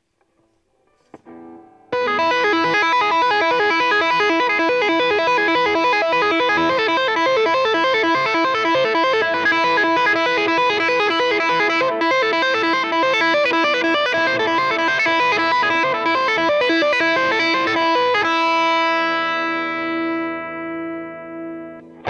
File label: disto tapping